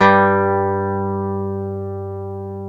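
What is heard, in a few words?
E 12 String guitar; Club-50 - Foundations Roland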